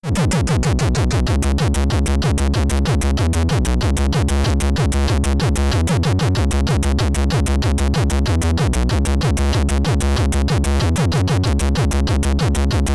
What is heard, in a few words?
Stage 1 Boss Theme (SID Stereo); creatures; commodore 64